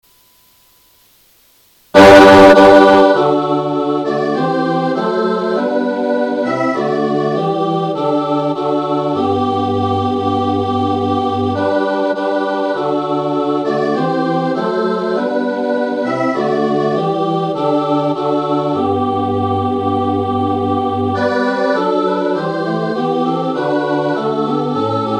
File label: SATB, rehearsal